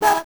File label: Voice Choir; Genesis